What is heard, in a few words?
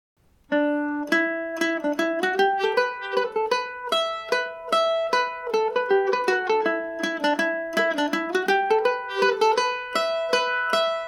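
winter night schottische G major, mandolin; The Winter Night Schottische; G&O #34 mandolin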